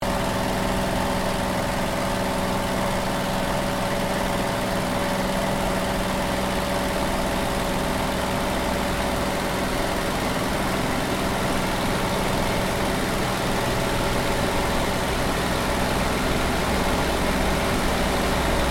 Aircraft P-40 Kittyhawk Flying Interior, WW2 Kittyhawk or Warhawk fighter plane; 1940s; Airplanes